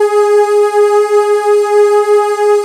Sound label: SMOOTH VOX G#3, SMOOTH VOICES; Best Service Alpha Dance vol.2